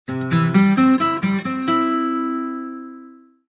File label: Message Guitar; LG T300 Cookie Lite